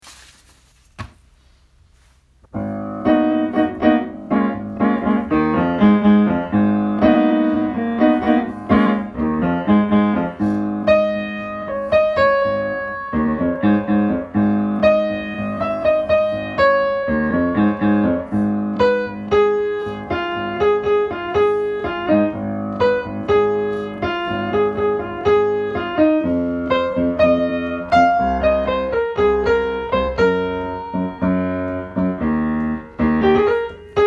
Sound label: melody Eb